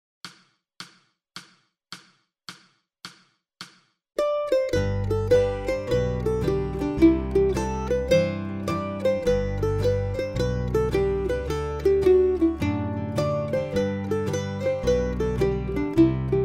Mandolin Variation Slow

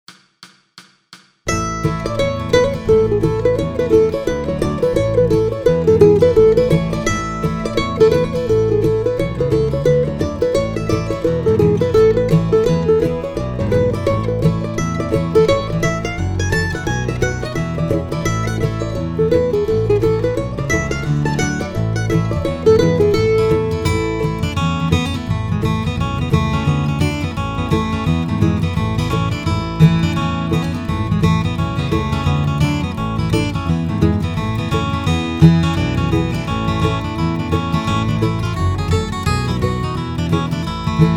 deck the halls fast band

